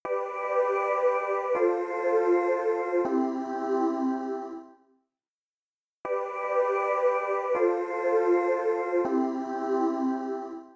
CHORD

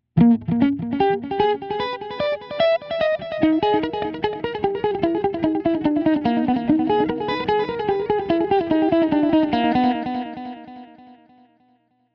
Dotted Triplet

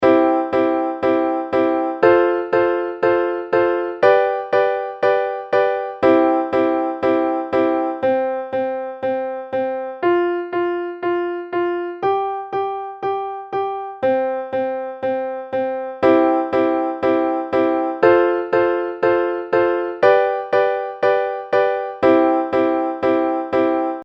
Chord Progression Example I IV V root triads; I-IV-V progression: triads, root notes, triads; basic three-note triad chords, in their root position; There are two big advantages to ear training progressions using root-position triads

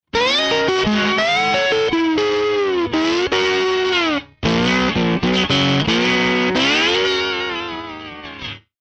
fender bassman all the way up

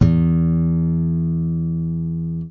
low e reference note

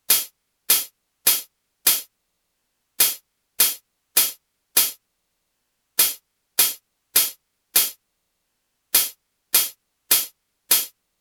Closed Hi-Hat 10kHz; frequency training series

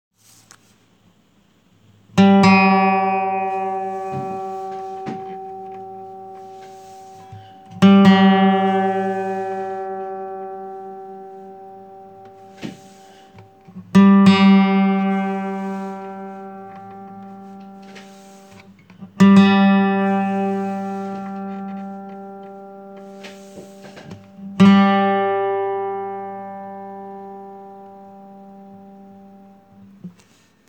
G string; being tuned

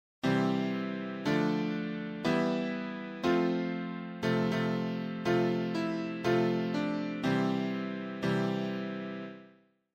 buildings chords melody wrong chords

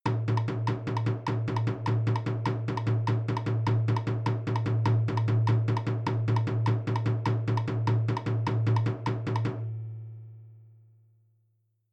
Triplets and Duplets

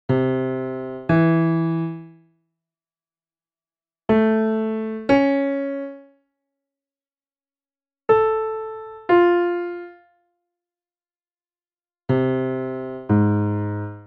Intervals major

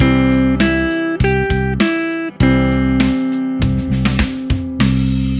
C major triad; C Major Triad over C Major chord

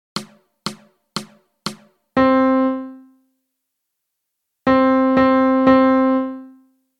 Melody; Lesson 1 Hard; Read Rhythms

